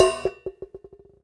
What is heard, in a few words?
Prepared Drums